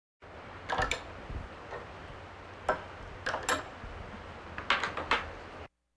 Animal effects snorts grunts farm ambience

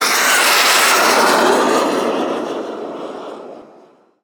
Missile; 1001 Sound Effects

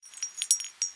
Chandelier Crystal Rattle; Foley - Rattles

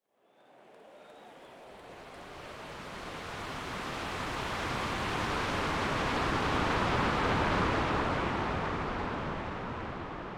Crowd Ambience; Pitch Black Audio Pong

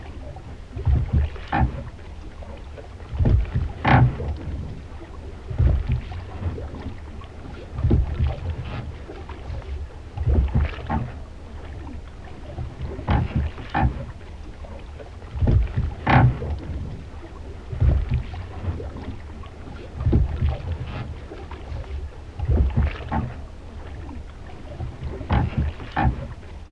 boat rowboat; best service Blue Box; sea traffic

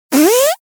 Fart Foley Strain Thin And Windy; Hollywood Edge; sound designer's toolkit v2

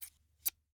Scissors, snap, snip, shear, med large, single; Eiravaein Works; Vaeyan IV

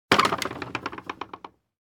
wood gate; Inquisitor 3

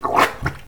Suction Toilet Plunger; SFX Kit; Foley - Misc

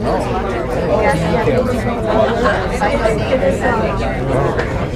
Bar Crowd; Backgrounds; 1001 Sound Effects

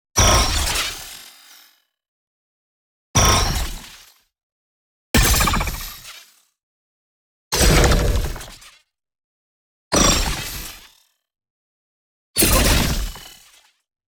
NEON ZAP Cyber Attack; boom library

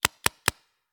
Paintball Gun; Sound Ideas; Guns

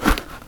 sand

